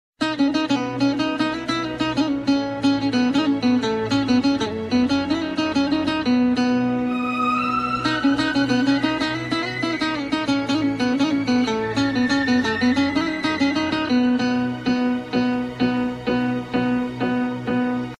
nice old guitar

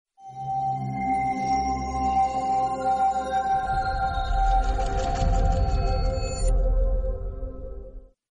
digital musical tone ringtone

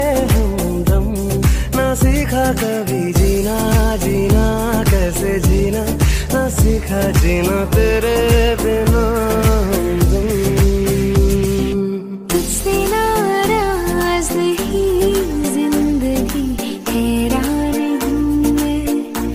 love song ringtone, bollywood ringtones

